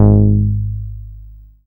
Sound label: BAS MKS-50; Best Service Gigapack 2